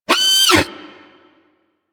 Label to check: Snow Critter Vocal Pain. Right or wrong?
Right